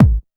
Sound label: dance 1, bassdrum; Ueberschall; Techno Trance Essentials; DRUMS; BD DANCE1